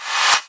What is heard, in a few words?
Reverse FX